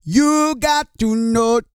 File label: REGGAE, Vocal Planet World Voices